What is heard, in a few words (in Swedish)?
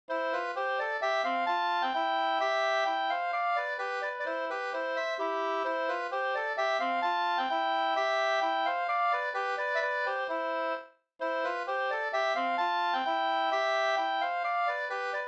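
slangpolska, two-part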